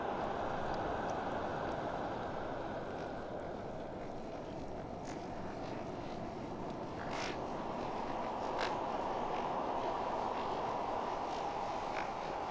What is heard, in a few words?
wormhole; starruler